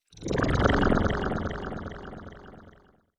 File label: Wizard laugh